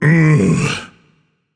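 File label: non-verbal ugh; smite; atlas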